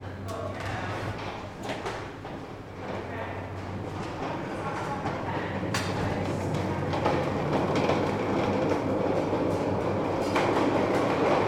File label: ambience store supermarket staff stock area; sound effects one